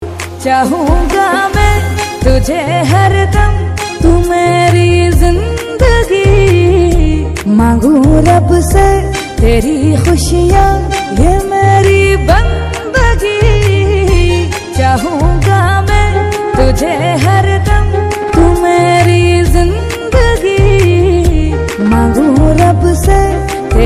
song Love Ringtone Hindi love ringtone Bollywood ringtone; tik tok ringtone